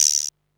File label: PERC, Techno; CLAPS; 138 BPM; Best Service ProSamples vol.54; AKAI